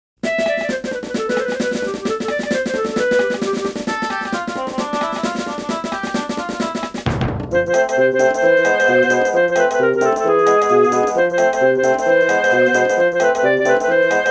march; cave

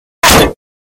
hit

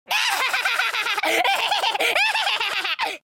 laugh

